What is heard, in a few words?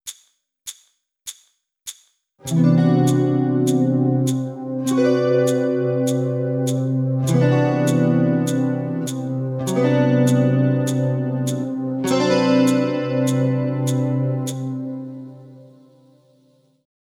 Fretboard Navigations, Guitar; Guitar Techniques 2018 Issue 289 December